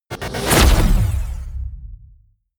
promo pad; whooshes rises

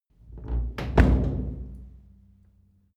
old door close hard

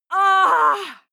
female moan hit pain; koerpergeraeusche; menschen; soundpool

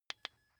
car key button click signal quick

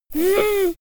little girl www; soundpool

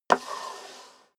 step high heels wood creak slide long; schritte

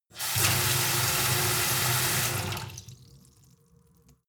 sink fill with water hard; industrie-wirtschaft; gastronomie

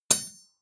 metal impact bar ring short; industrie-wirtschaft

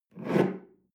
espresso grab tablecloth slide

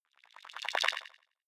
sci-fi subterranean light up; swooshes-swishes

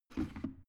plastic water boiler lift up soft; maschinen; wasserkocher

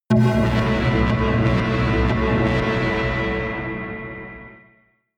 synth hit tube; whooshes rises

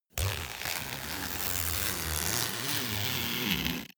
zipper pullover jacket close slow; objekte; reissverschluss

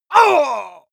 male combat hit hard

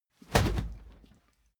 whoosh cloth fast medium; swooshes-swishes

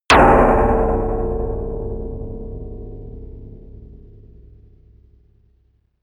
wobbling metal; hits explosionen

